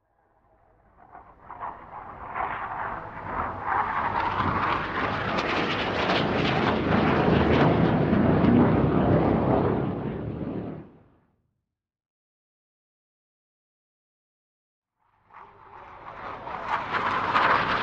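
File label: Plane Hawk Fast Close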